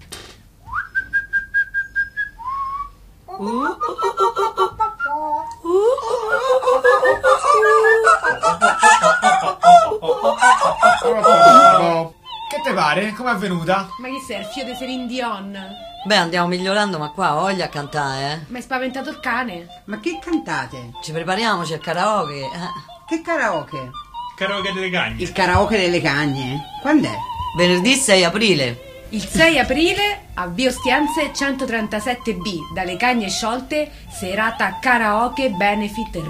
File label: Karaoke